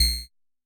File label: Blips and Clicks Synth Click, Analog Synth; Sound Spark LLC; Analog Synth Volume 1 Bleeps, Sweeps and Creeps